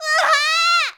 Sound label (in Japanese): shonen himei